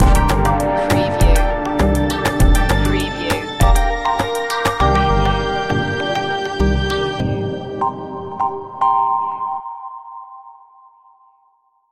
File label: the clockwork stinger